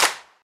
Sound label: original hand clap G# key; single hits one shots